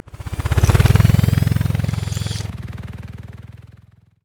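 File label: hermit robot leaving; LoL; emitters map12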